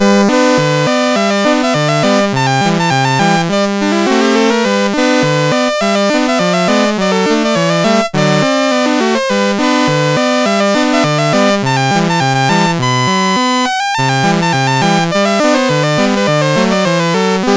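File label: River Chase, MOS 8580 SID chip music, games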